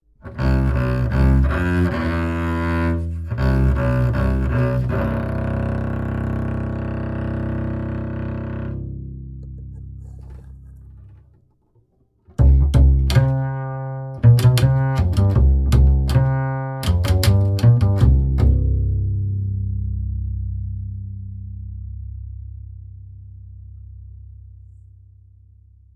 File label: upright bass dtp640 rex dynamic dual; lewitt